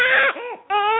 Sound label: crying, baby cry